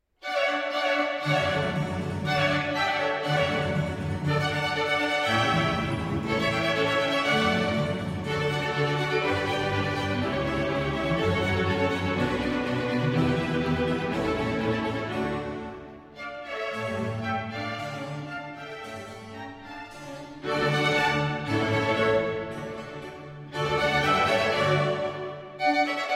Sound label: Violin Concerto in D Major Allegro Non Troppo Ma Vigoroso